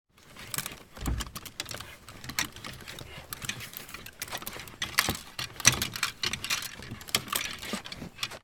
household person rummaging through childrens wardrobe with clothes on hangers